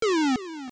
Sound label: Enemy Fall; An enemy falling in a hole